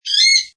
Weka call, Exotic bird - New Zealand bird Weka, single high pitched call.; Birds; Lynne Music